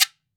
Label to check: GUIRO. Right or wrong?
Right